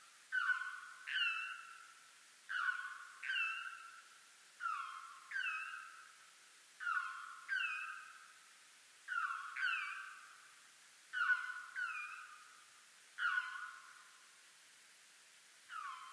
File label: Juv Townsends; Roy-stables